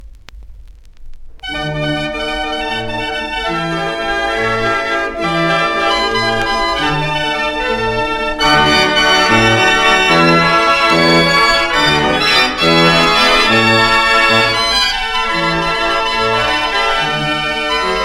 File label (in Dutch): Rijdend Cilinerorgel; Jussenhoven